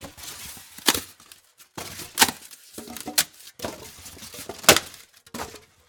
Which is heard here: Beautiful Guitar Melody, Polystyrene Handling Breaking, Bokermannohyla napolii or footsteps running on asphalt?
Polystyrene Handling Breaking